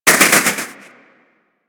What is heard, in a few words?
Player Resurrect Start